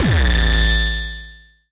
nasty stormfront; Nasty Stormfront Electro FX

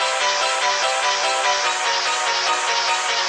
tinny two tone synth octaves; SYNTH AND ELECTRONIC LOOPS

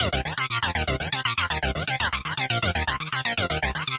distorted portamento synth; SYNTH AND ELECTRONIC LOOPS

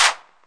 Korg KPR-77, drum machine

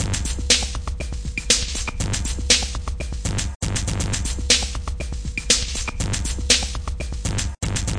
120 bpm lofi distorto claves n kick

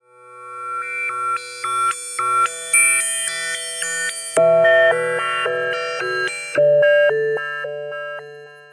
space pad samplehold, synth and electronic loops

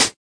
hat; Hats

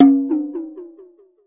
Processed Ethn Perc, Doru Malaia Percussion; ETHNIC and WORLD PERCUSSION

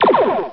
Phaser, Cheesy Lo-Fi Sound Effects

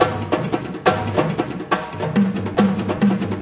tribal spirit, drum loops and breaks

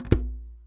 arabic perc, Arabic Percussion Kit

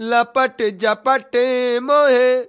male devotional, Indian Singing; VOCALS and SPOKEN WORD

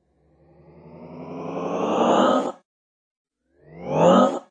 growing up, pain pack sweeps and fx; REMIXABLE COLLECTIONS; Ze Franks Pain Pack

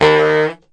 sax blurt

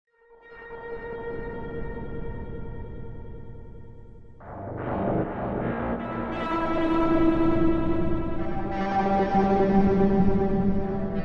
swelling moody echoes; Atmospherics

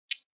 click; REMIXABLE COLLECTIONS; Ze Franks Pain Pack; pain pack percussive and bips and clicks

